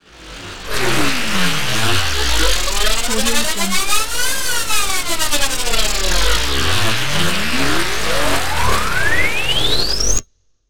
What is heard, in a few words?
riser game sound